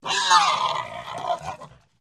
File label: Pig Grunts & Squeals